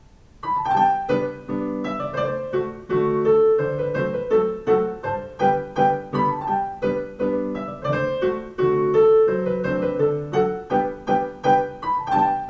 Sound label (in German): polka; baumgartl